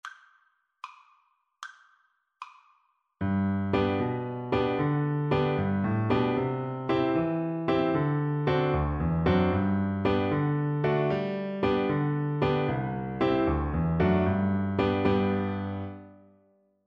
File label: Viola